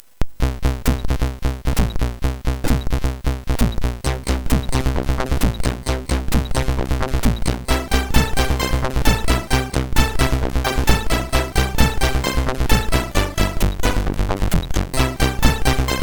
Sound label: sid, MOS6581R4